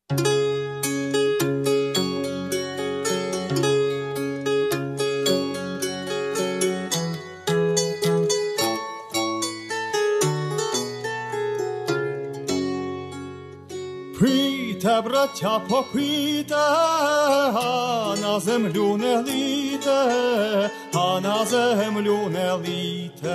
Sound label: Ukrainian baroque song